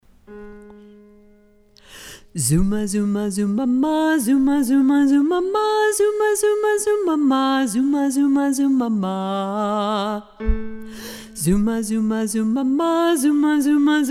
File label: Mid Low Range Exercise; warm-ups